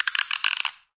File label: Thumb Screw Turns, gears